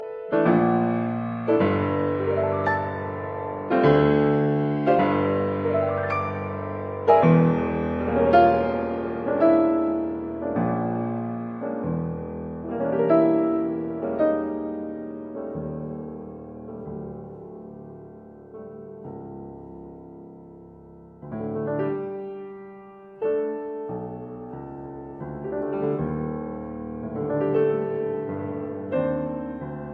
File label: Piano Sonata I. Maestoso ed energico